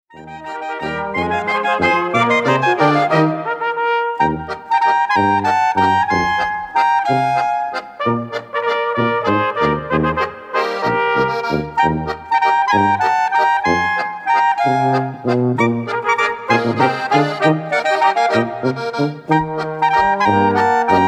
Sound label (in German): Dreier; Maschant Maschanter